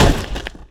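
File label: stone solid, Impact; Cars; XBOX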